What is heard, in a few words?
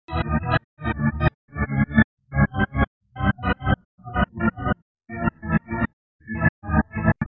Tense Organ; Gutterball 3